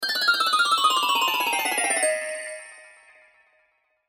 Harp Glock Descend, Harp Glockenspiel Descend Sequence; String; Blastwave